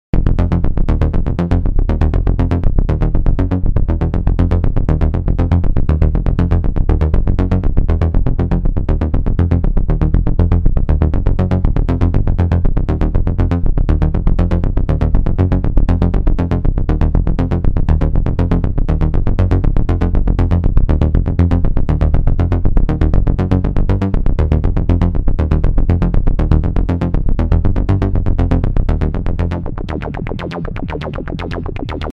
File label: bass synth